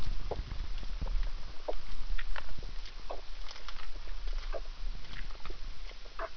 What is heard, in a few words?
gulping, fish sound; tivoli bay